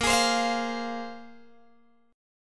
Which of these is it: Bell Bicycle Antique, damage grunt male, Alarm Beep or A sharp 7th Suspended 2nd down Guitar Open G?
A sharp 7th Suspended 2nd down Guitar Open G